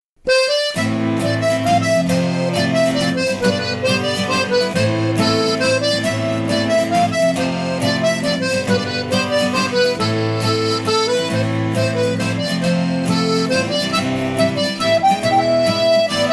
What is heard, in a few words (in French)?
Mazurka theme; Le petit gamin